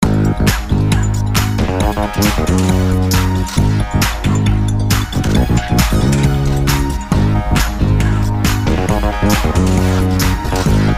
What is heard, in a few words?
theme TV sports, medium